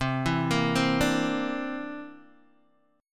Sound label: C 9th C down Guitar DADGAD